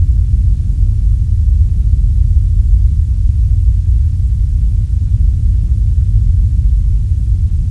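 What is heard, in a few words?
ambience rumble; cruisecontrol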